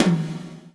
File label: GATE TOM, Drums Acoustic; USB Soundscan vol.10; AKAI; 90s sample CD; GATEKIT 2